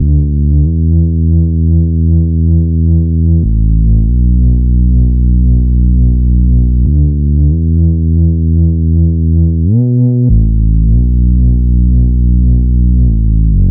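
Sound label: Bpm140 Bass Loop G major D major; Prime Loops; Dubstep Illusions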